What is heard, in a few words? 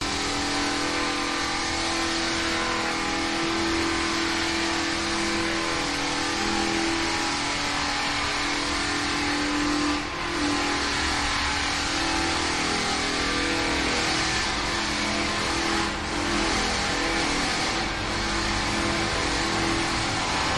Construction Site Angle Grinder Tool Sound; Budapest Hungary